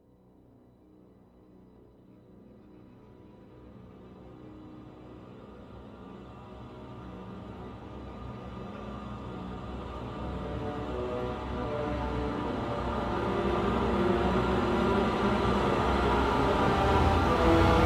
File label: orchestral version